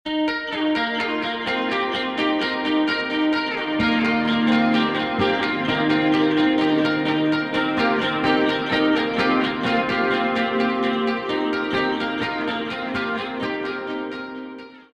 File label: Pipe Choir